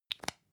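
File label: lipstick close hard, Bathroom; haus-garten; badezimmer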